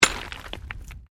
Digging Pick Stones

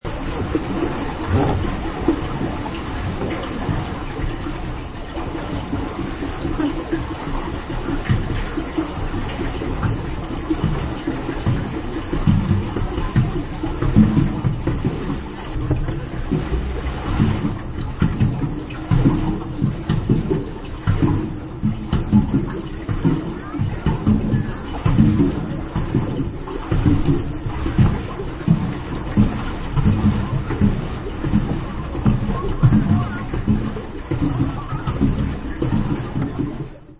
Pddle Bt, Paddling a paddle boat.; Boats; Vehicles

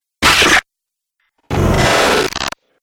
Dubstep Scifi Effects

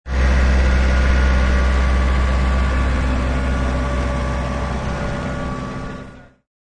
Farm Tractor Short, Small farm tractor drives.; Vehicles

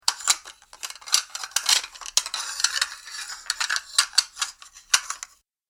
Saucers Shuffle Noise, dishware

